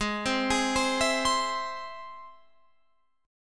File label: G sharp Augmented G sharp Guitar Open F; G#+ arpeggiated; 20,19,20,19,19,19; JGuitar